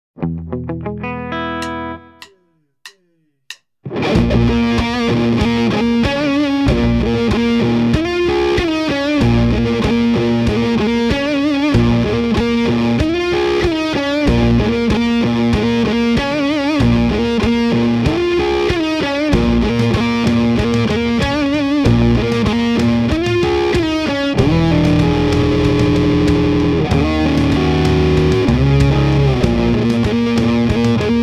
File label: Bluesy Fuzz; Psionic314